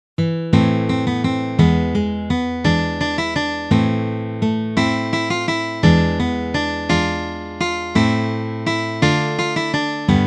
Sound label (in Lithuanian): gitara